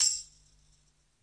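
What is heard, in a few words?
tambourine, Classic Hip Hop; STYLE KITS; Classic Hip Hop Mega Kit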